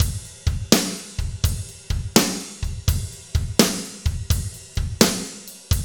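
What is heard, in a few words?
slow shuffle, Roland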